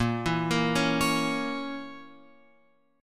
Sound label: A sharp Diminished, chord; A#dim Chord {6 7 8 6 X 9} arpeggiated